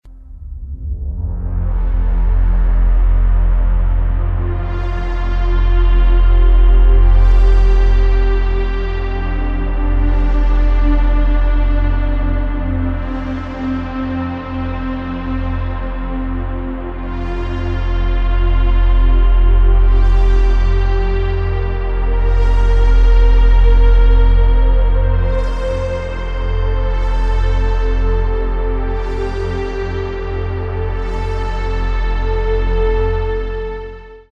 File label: pad blade, yamaha sy99; synth; Pad: Blade walker